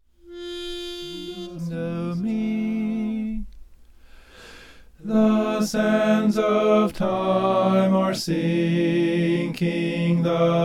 the sands of time soprano

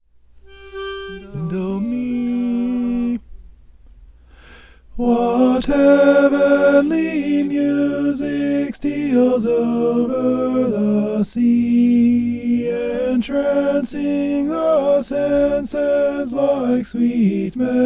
what heavenly music tenor

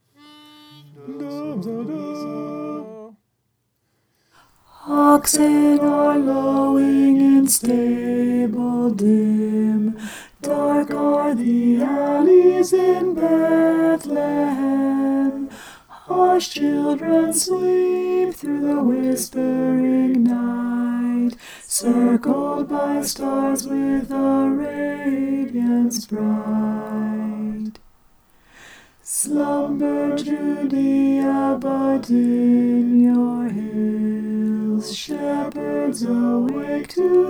alto

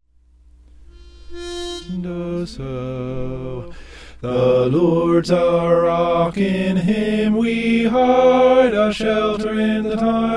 soprano

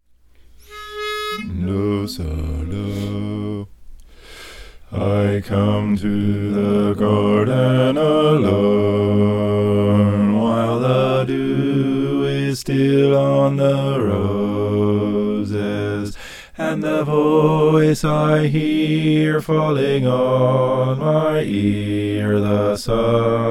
bass; in the garden

